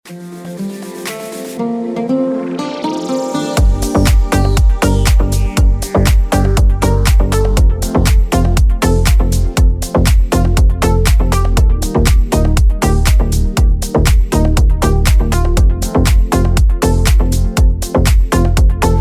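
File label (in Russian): звонок без слов в стиле нового диско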